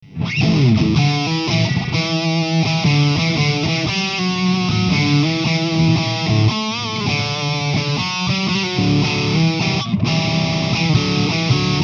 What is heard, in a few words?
hi gain, distortion, Nobels ODR-S